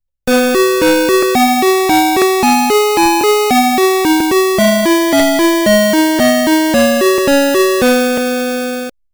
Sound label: Little star